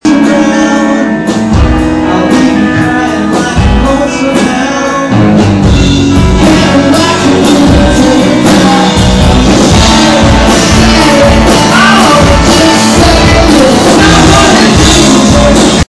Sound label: support band